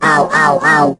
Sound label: hurt